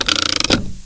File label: CLUTCH; FRANK